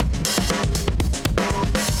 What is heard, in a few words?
Beat Style, 120bpm, breaks